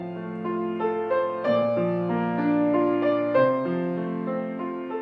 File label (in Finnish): piano